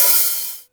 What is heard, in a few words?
HAT, Hip Hop; HipHop Kick Snr Hat; PlatinumPhattCD1; E-MU Producer Series Vol. 8 – Platinum Phatt